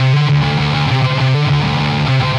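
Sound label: Distortion Riff Guitar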